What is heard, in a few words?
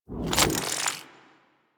Spear Throw Charge C, Weapons; Warframe; Corpus; Spear Gun